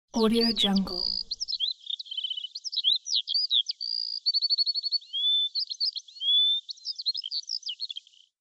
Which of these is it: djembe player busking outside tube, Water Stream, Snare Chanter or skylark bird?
skylark bird